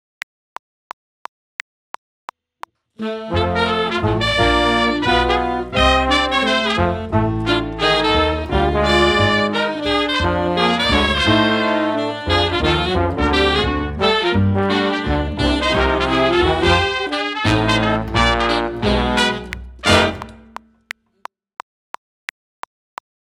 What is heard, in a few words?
Drum Audition Track